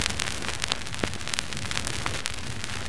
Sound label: Noise Vinyl Dirt Soft; Ableton Live 12 Lite; Vector; Sprites